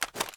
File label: ar reload fast dmr end; weapons; gmod; viper; scharlie